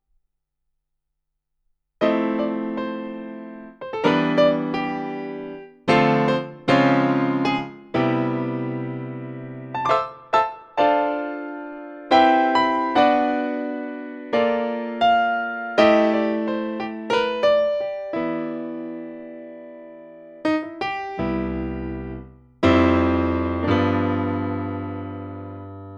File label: Kawai CA15 Concert Grand